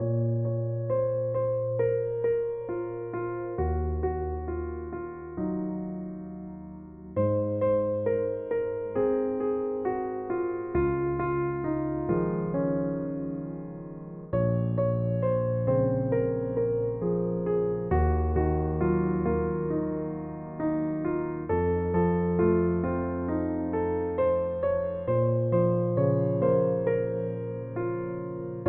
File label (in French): Jazz douce musique d ambiance